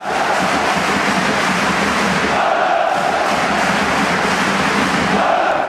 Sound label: chant; ysoccer